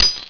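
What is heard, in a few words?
CHAIN SNAP, metal hit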